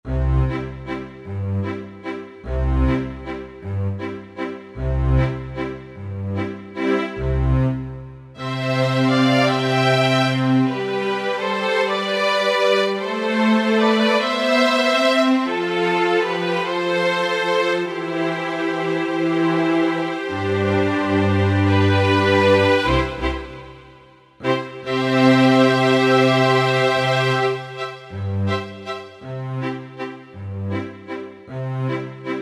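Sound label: Vienna Strings, Roland SR-JV80-09 Session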